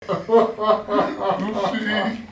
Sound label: ihihihaha